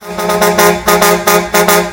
cornetas

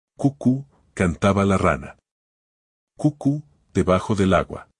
cucu cantaba la rana

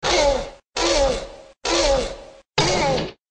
gritos llamada

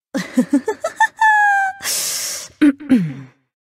risa; akali

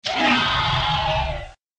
grito

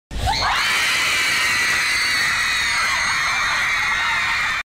mujeres celebrando

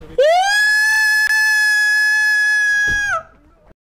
grito varonil; vegetta

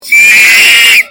chillido,ostrix

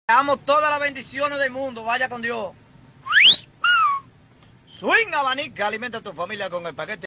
swing abanico

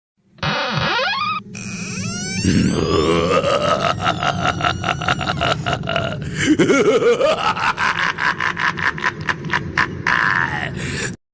risa macabra

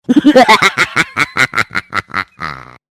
risa moustro

